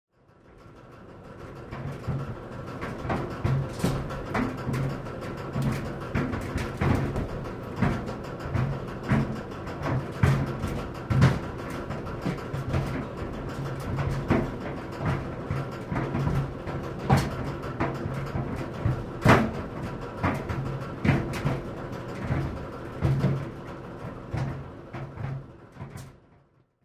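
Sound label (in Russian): сушилка для белья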